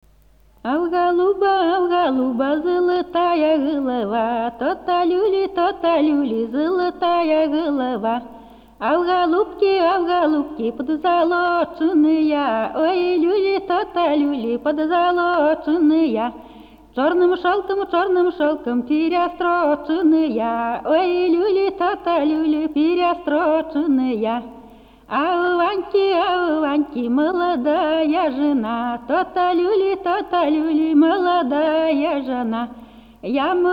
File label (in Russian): Русское Поозерье. Свадьба. Приезд к жениху. Застолье; Фольклорный фестиваль имени Ольги Сергеевой; А у голуба, а у голуба золотая голова